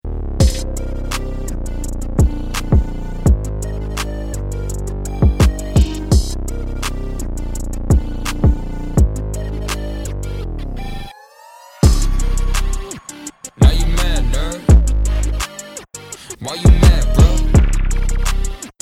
start action, music

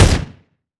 lfe pistol shot, dry; weapons; Half Life Alyx

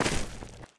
footsteps, grass; player

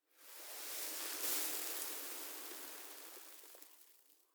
sand gust; dust2

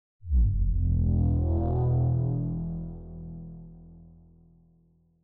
bass; music

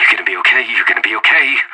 scared emote; anarchist

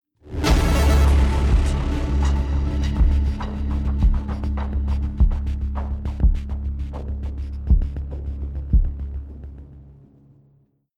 lost round, music

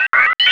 sphere blips md; npc; Portal 2

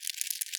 rodent scratch short

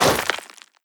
scuff, footsteps player debris

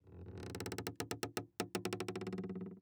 tree; ambient; Counter Strike Model Pack; CS:GO; survival

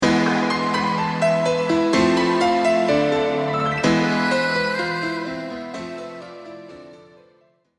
deathcam

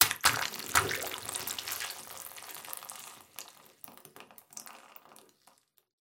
xen dripping; ambient; Half Life Alyx

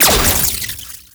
orb bolt

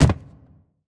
phys cube lg; Portal 2

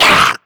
attack grunt, headcrab, creatures; headcrab classic; vox

